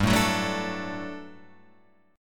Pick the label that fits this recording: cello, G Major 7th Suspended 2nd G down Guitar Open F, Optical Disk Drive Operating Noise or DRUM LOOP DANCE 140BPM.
G Major 7th Suspended 2nd G down Guitar Open F